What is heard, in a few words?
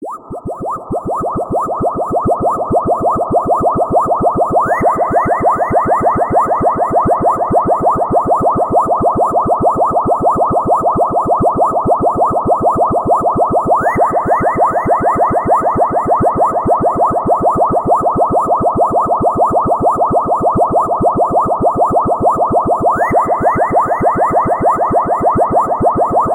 UFO